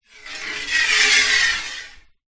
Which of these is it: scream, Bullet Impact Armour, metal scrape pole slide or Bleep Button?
metal scrape pole slide